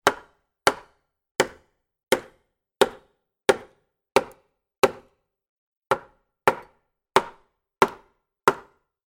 hammer nail into wooden decking; industrial

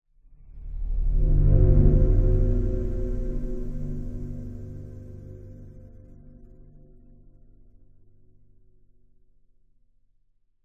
sound design cinematic swell dark eeiry

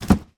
foley cardboard box package drop on top of others; zapsplat

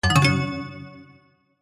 mobile game warm negative tone

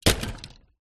leisure toy cars drop into cardboard box

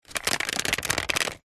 plastic creak stress; foley

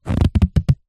househopld rubber glove stretch

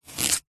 carrot chop knife single; food

